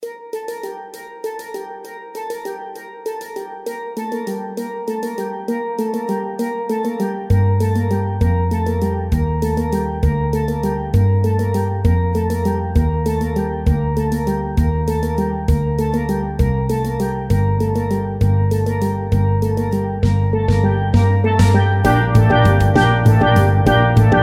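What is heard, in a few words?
Carol Of The Bells Overdrive, Steel Drum; Mykola Leontovych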